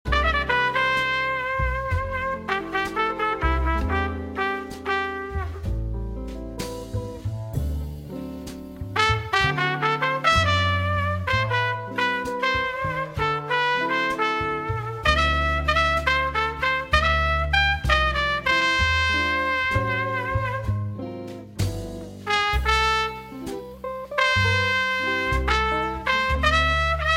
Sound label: Jazz